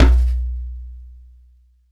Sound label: F-DJAMBE BAS; AKAI S-Series CD-ROM Sound Library VOL-5